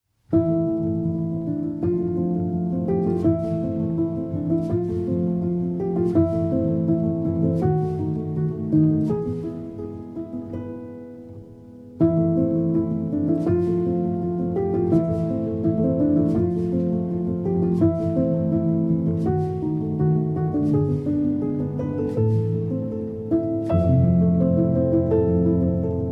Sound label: Waltz in A Minor for Piano; Op. 34, No. 2